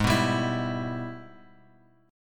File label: Minor Major 7th Sharp 5th; G#mM7#5 Chord {4 2 5 X 5 X} strummed